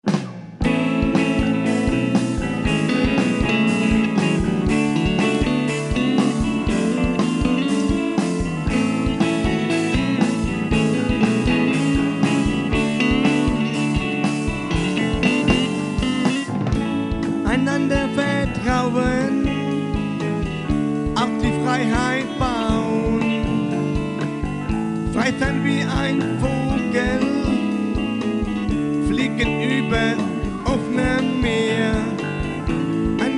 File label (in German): Freiheit Gitarre